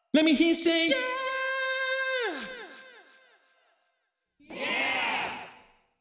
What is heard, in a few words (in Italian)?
LOOP VOCALE